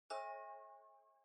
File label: OS Sound (Speaker-Notification)